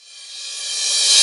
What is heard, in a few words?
Reverse Cymbal; Best Service; House Definition VOL-1; Cymbal Stuff